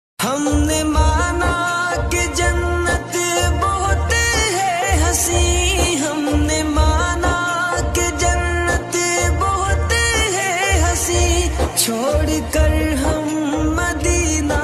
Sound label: naat sharif ringtone, kavvali ringtone